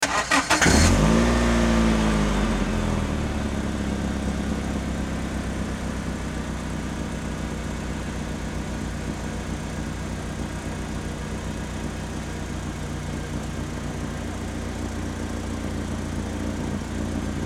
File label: Auto Compact Start-Stop